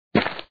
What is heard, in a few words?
drop; Gun Mayhem 2